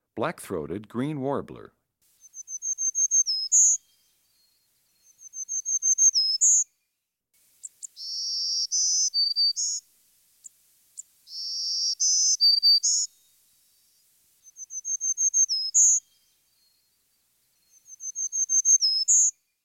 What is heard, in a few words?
black throated green warbler